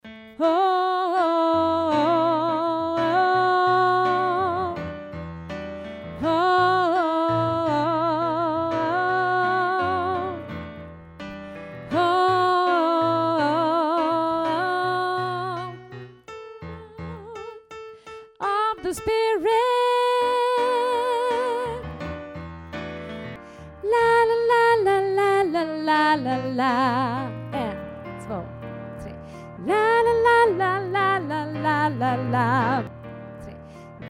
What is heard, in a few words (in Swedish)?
sopran, stammor